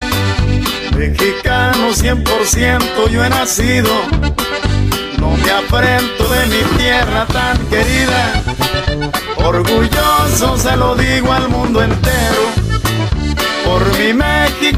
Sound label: Mexicano